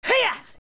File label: Whip; Adult Link; Link whipping Epona